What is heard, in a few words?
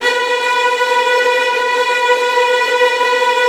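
STR TREMOLO, Virtuoso Strings, B2, right; ILIO; Kirk Hunter Virtuoso Strings VOL-3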